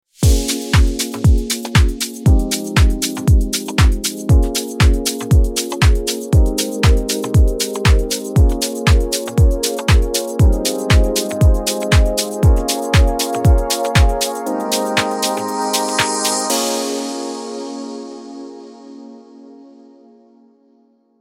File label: soft celebration win